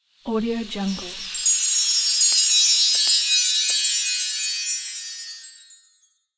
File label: magical sparkles